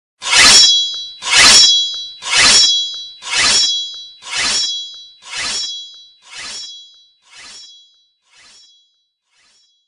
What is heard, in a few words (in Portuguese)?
vinheta